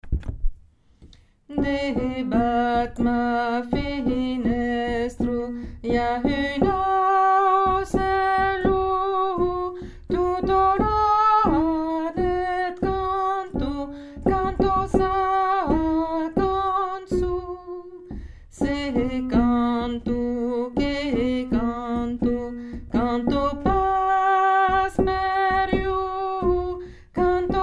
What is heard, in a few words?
alto; se canto